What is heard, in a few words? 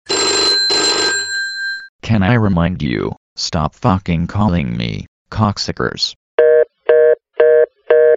Calling Reminder